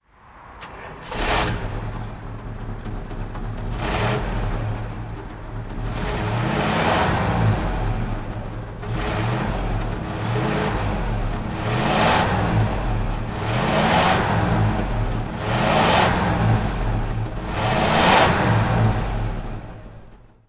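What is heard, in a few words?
bentley continental S2 throttle, Engine sound; Bentley Continental S2 Park Ward DHC, 1960; V3D/ ClassicarImages; Marc Vorgers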